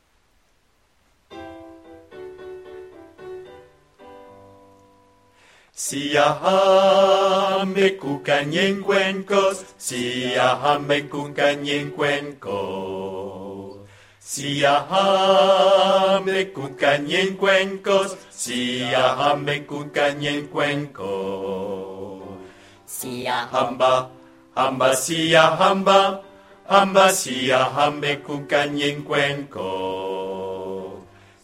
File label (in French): basse VOIX; siyahamba